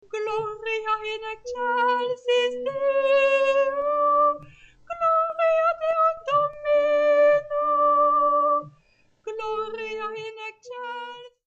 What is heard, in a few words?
Gloria Tenor